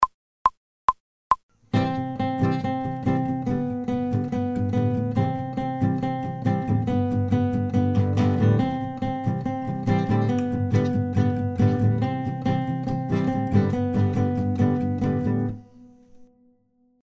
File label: fast, guitar, without metronome